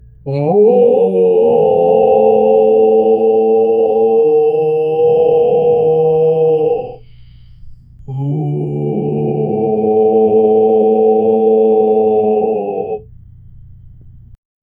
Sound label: a long and deep whale